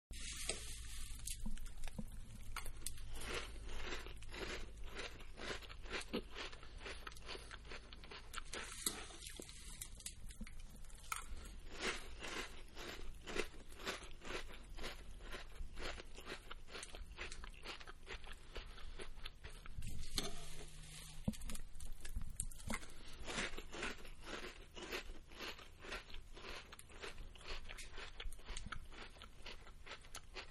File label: Cereal-Eating; Human; Cereal-Eating 2